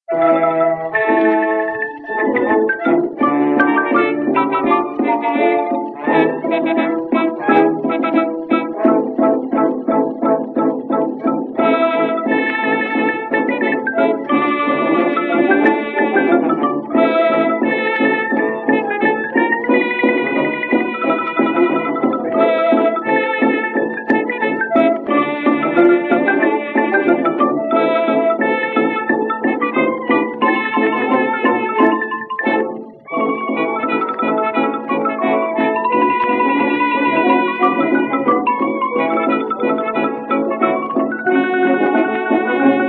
Ragtime Era Favorites; 1918

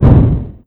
explosion small; endless sky

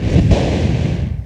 whirlwind die; edgar; enemy

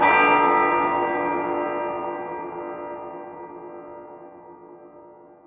bell; edgar

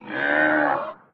tauroch hit; wesnoth; Under the Burning Suns

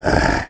tortoise die; edgar; enemy

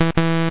wrong; zsdx

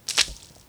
minelayer; endless sky

